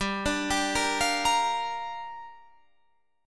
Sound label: G Minor 7th, Guitar, Half Step Down